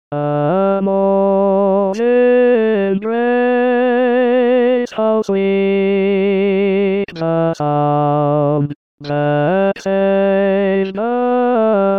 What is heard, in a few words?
tenor; amazing grace